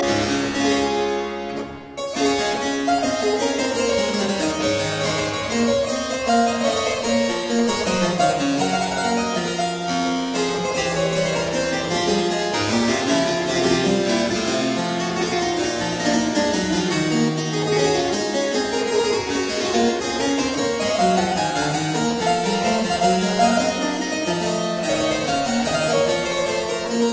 Suite in B minor Courante; Draghi; Arthur Haas

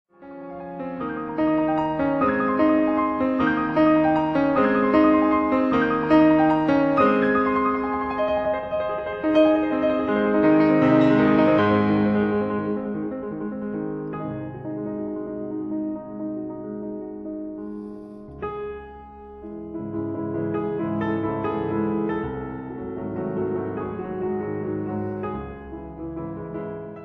Impromptus for piano in A flat major Allegretto

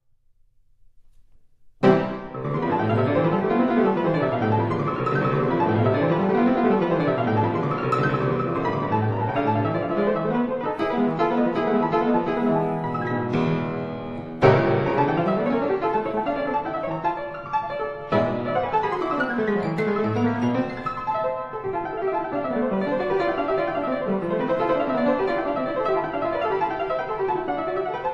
Etudes for piano C major

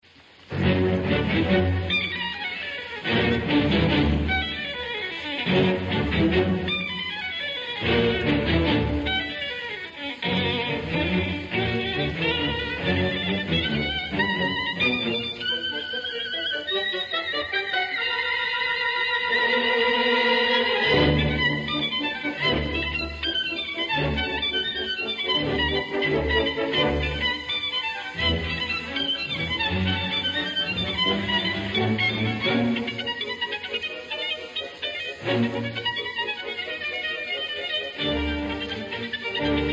Violin Concerto in D, Allegro impetuoso; Busoni; Op. 35a

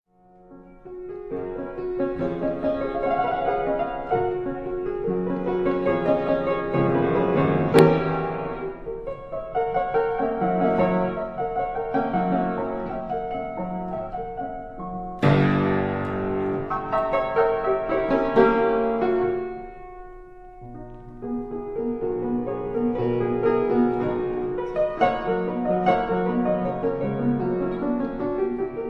Etudes for piano B major

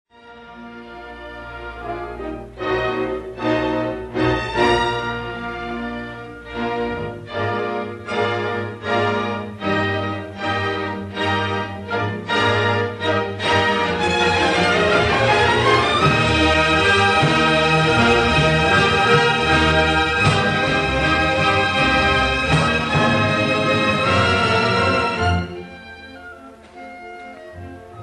Symphony in E flat major, Allegro con brio; Symphony No. 3; Eroica; Op. 55

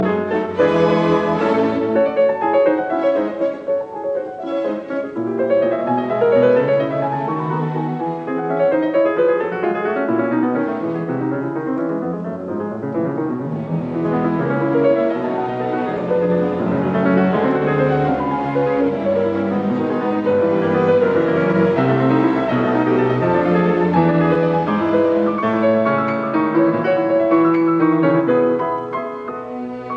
Piano Concerto in A minor Allegro vivace; Schumann; Op 54

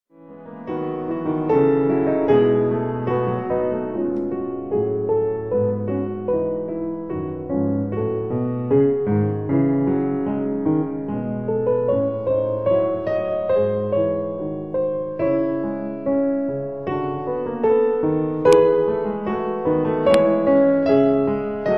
E major Fugue; The Well-Tempered Clavier, Book II

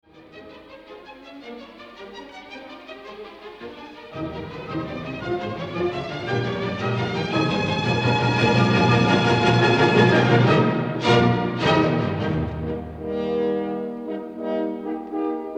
Symphony No.3 III. Scherzo. Allegro vivace; Beethoven

